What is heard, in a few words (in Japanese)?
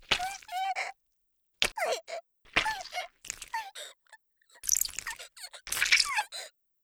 "あひ"